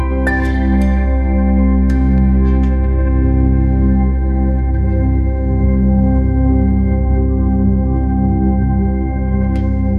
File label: breaks